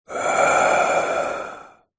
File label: human breath out exhale slightly sinister close up; zapsplat